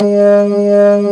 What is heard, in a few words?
B3 Lo Dist Slow F#2